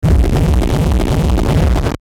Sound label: Distortion Pulse Swell Multiple; Distortion Pulse Swell Multiple Crackle; Blastwave